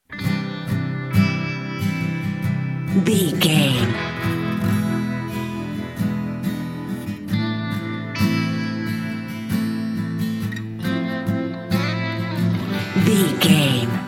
swim upstream quiet start

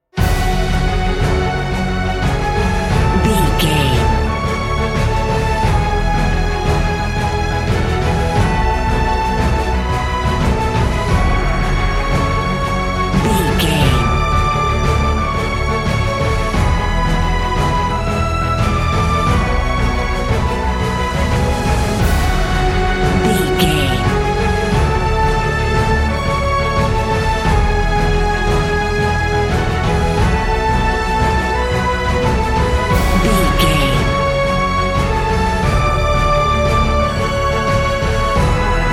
epic cinematic

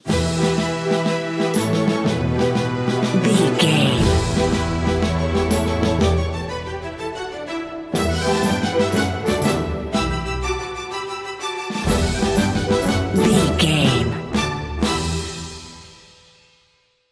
sailing seven seas bumper

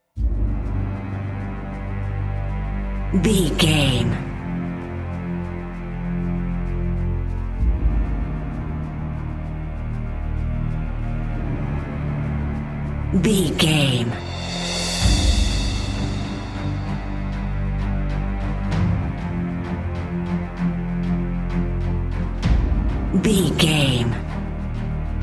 epic cinematic drum and bass mix

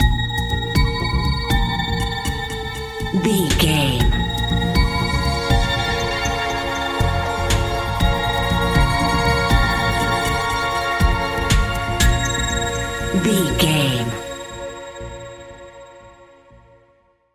mysterious video game expedition bumper

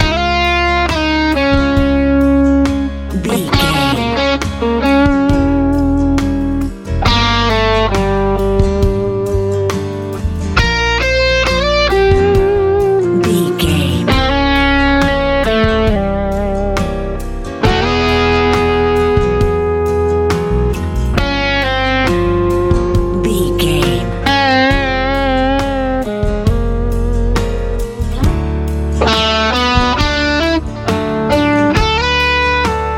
hopeful big nice airplane ride life easy pop

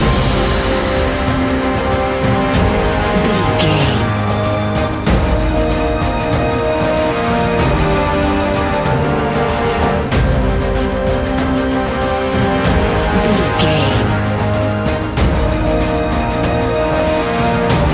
epic cinematic loop

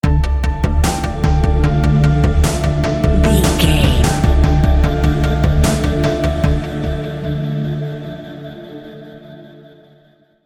criminal motive sting

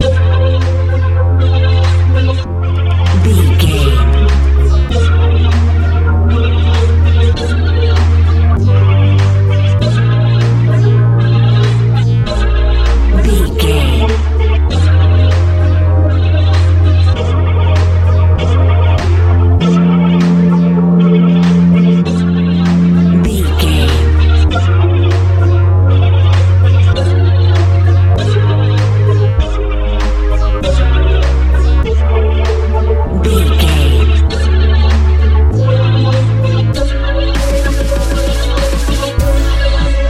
bright hot big wind sprint life modern pop

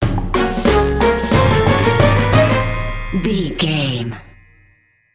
upside down inside out sting

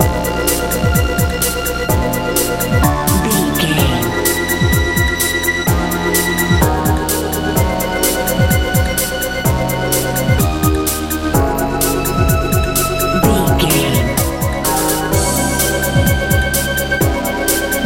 hopeful hot big wind sprint modern dance

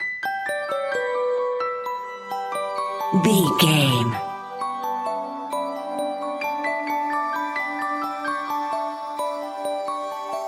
whimsical utopia

